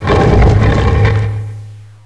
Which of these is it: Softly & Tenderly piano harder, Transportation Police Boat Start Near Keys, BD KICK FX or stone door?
stone door